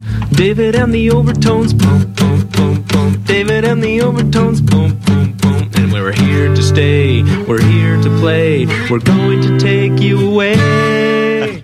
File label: Acoustic Live